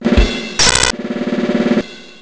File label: Drums (Glitched); LG U8100